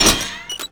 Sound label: connect granted